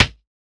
snappy snare single hit a key, acoustic snare sound tuned to the A note